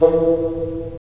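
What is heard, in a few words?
wind bassoon; LA Composer vol. 3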